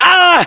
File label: pain